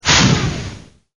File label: missile